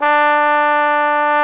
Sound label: BRS FFBONE D4, Trombone; Roland L-CDX-03 Disk 2